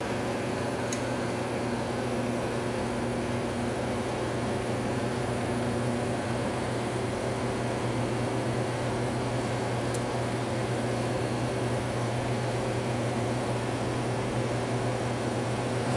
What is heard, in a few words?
continuous whirring hvac system hum; ambience